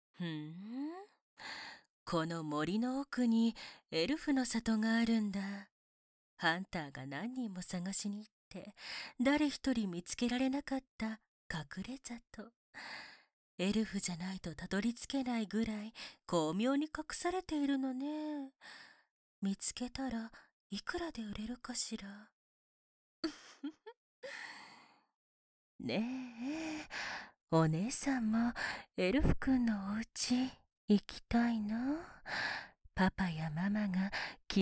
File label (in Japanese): 背後抱きつき耳舐め手コキ (手コキ・耳舐め)